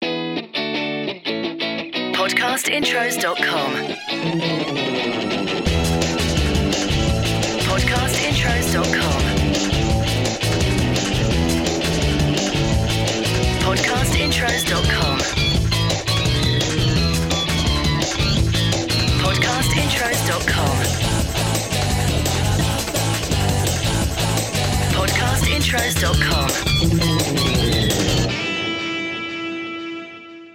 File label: DANCE; Podcast Watermark